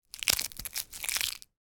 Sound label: food cellophane wrapped candy stick movement in hand